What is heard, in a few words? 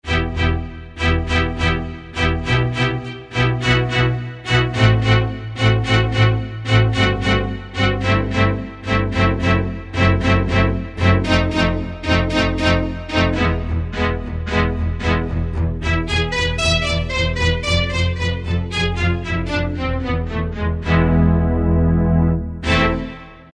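Arco Strings, Korg T3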